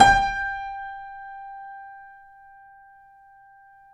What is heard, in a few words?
KEY, YC7 Piano ff